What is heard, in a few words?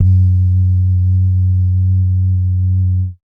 bass dums; Spectrasonics Vocal Planet; Jazz FX